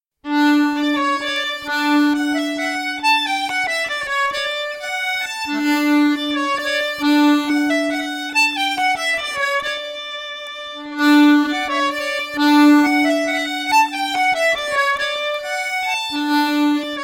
Waltze - Belgique; Waltze Empire

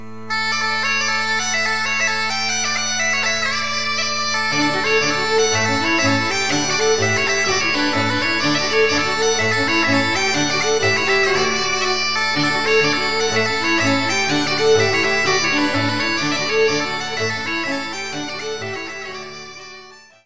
Allemande de Stavelot - Belgique

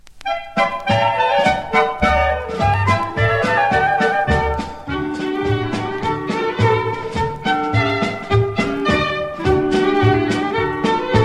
Klappdans

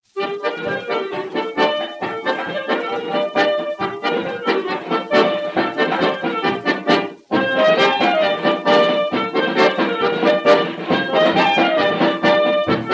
Lezginka - Ukraine

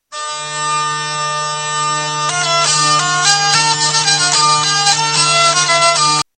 vielle a roue

